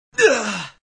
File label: Collision; Sonic.EXE